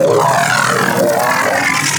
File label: Arch Gun Alt Charge Full; Warframe; Weapons; Archwing; Hildryn